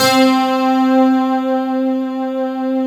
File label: JD MIDI GT C4, JD GUITAR, Synth; Big Fish Audio; Synth City